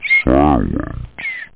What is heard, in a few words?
silence; da capo vol1